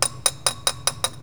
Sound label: Hammering, INDUSTRIAL